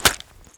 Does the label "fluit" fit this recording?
No